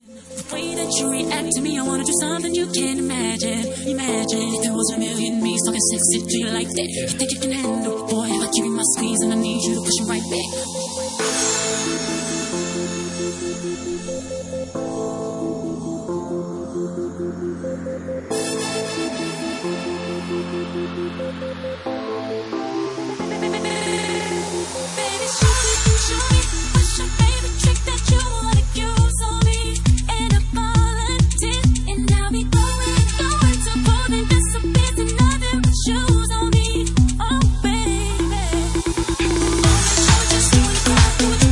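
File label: Organ Mix, Bassline House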